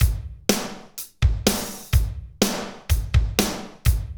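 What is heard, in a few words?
medium tekno, Roland drum beats; Northstar - Drumscapes Roland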